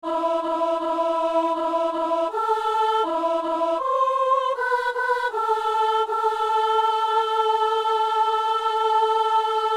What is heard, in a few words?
soprano; Psaume 137 (138); Messe du 17 janvier à Saint-Denis